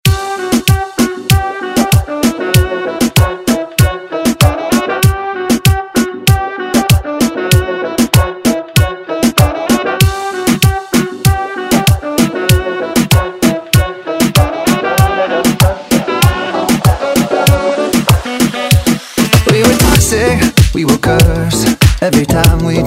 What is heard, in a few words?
Moombahton Redrum